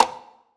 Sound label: clap one shot; Slate; SD PASADENA Pop Sample Pack